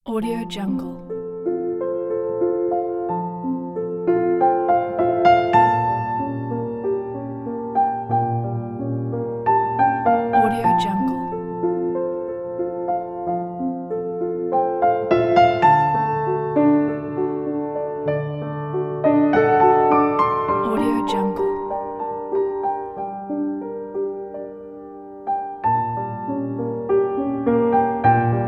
calm expressive piano

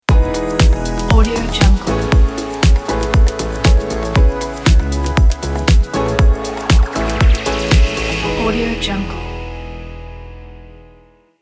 house logo

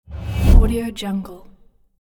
woosh

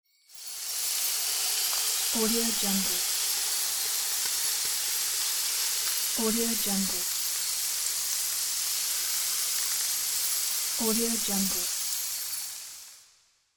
ice storm

